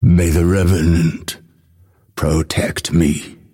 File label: death; dota; phantom assassin